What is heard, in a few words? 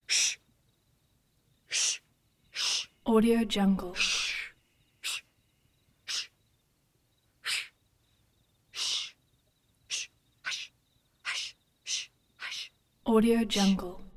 female shhh exterior shushing to be quiet hush